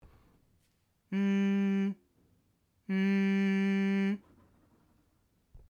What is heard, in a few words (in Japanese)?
ハミング